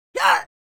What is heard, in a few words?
male grunt punch; Butterfinger Video Sound Effects